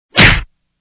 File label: punch